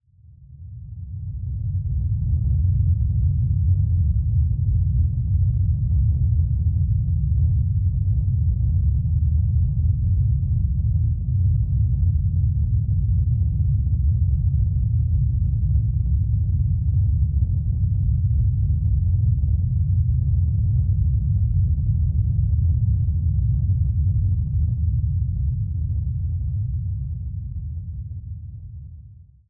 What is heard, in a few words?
earthquake